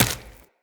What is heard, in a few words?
Run Flesh, Footsteps; Tenno